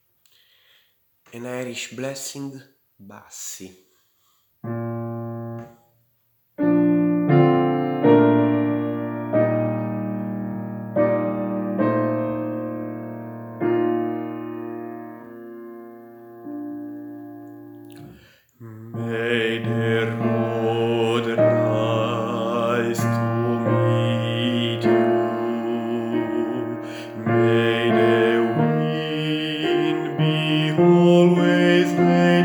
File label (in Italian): bassi